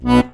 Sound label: HOHNER, akkordeon